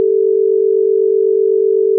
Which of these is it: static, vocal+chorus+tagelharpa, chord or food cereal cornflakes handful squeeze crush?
chord